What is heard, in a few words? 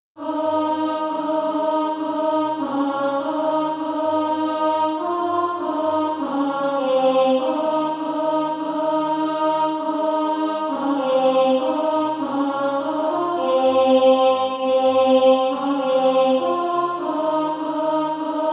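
alto, Practise Music; SATB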